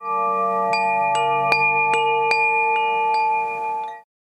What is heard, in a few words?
sms cymbals; Mudita Pure